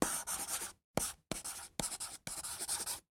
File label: Write on Cardboard with Pencil; Office Supplies; 1001 Sound Effects